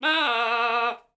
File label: pre ram, goat; minecraft 1.21.5; mob